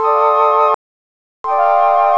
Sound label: Six Flutes mix